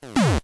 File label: bullet shot; bullet bill